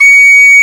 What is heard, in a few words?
Penny Whistle C#5; Planet Earth; Digital Sound Factory Planet Earth